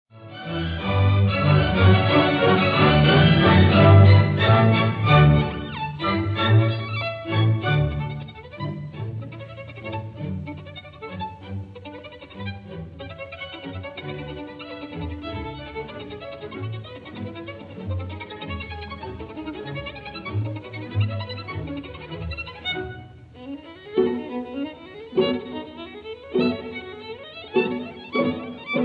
Violin Concerto in D major Allegro giocoso ma non troppo vivace; Brahms; Op. 77